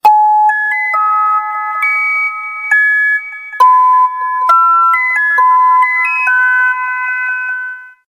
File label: message ringtone